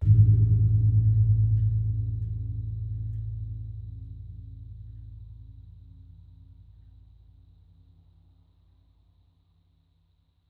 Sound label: Gong Ageng, Gamelan